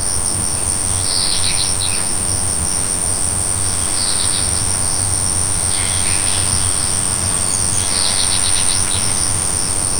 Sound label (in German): afrika savanne nur natur; africa